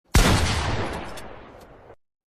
gun shooting meme

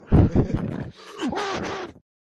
goofee scream; goose

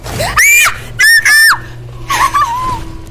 scream

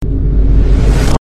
boom reversed; vine-boom

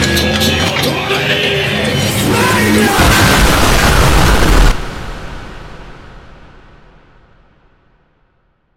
kill sound

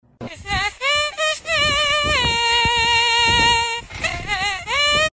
mosquito; aot

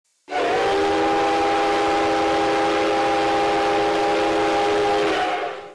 big one whistle

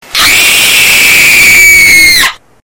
screams

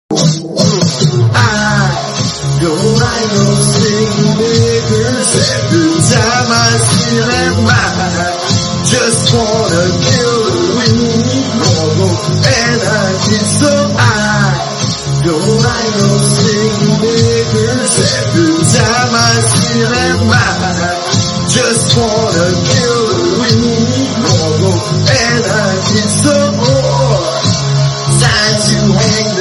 ringtone

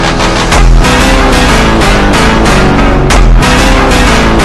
aura phonk

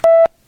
bleep beep sound

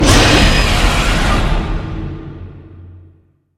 horror attack

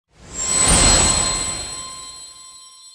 spell

